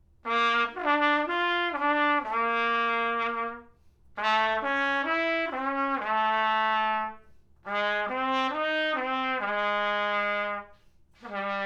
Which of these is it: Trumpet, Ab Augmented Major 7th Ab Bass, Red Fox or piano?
Trumpet